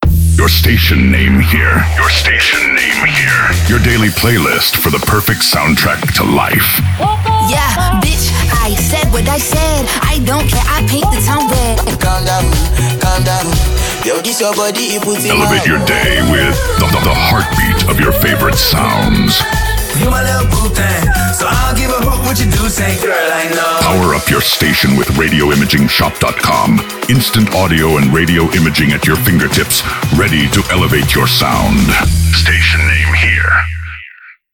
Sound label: Radiojingle CHR Beatmix